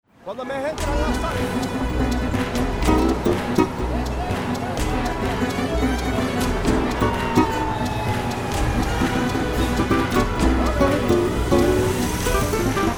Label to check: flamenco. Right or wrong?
Right